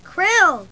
KRILL SOUND

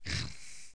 Cavewyrm; DarkDemo

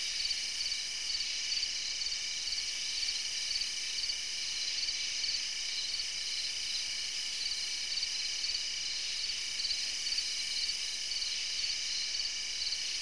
Cricket Ambience

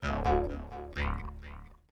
ethno 125

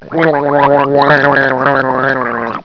gargle; Kaboom! Junior

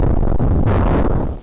impact

